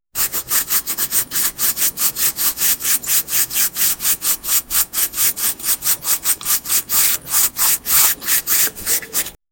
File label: the sound of a soft brist, haircut